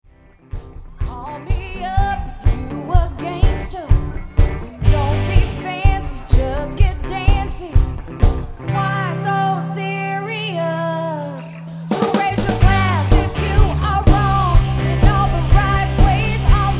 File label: Live, Cover Band